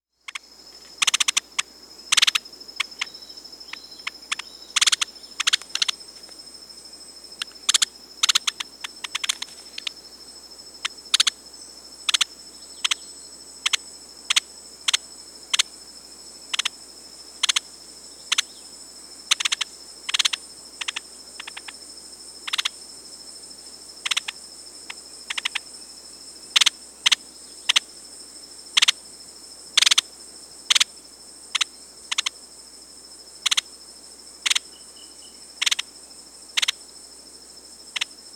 White-chinned Woodcreeper (Dendrocincla merula), Peru, Birds of the Amazon; S. America